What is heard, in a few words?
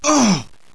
npc pain